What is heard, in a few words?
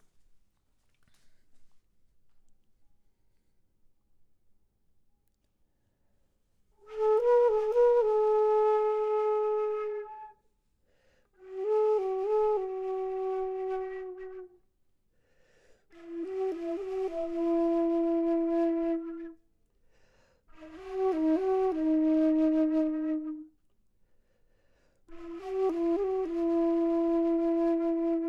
flute low; logic